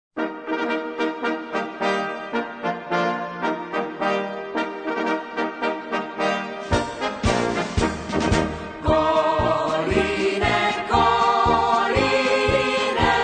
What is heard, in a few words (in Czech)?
SMES POCHODU